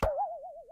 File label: boing